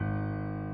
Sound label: key